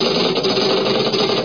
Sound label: computer